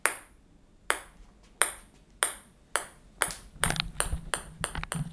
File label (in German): Geraeuschequiz Tischtennis